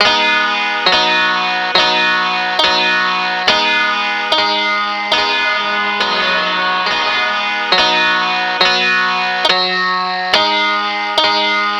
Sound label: piano